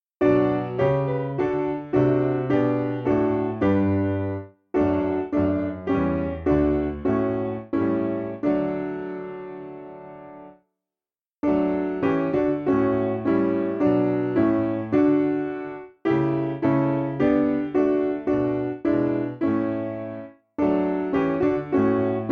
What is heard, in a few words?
Piano; Bread Of Heaven; Conder